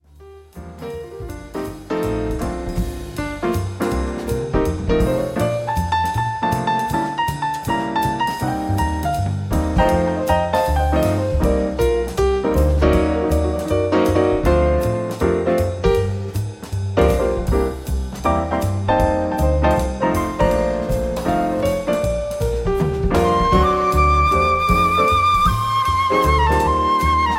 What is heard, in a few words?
Flute